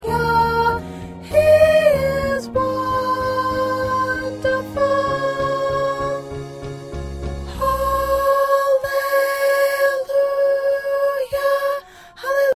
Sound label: Soprano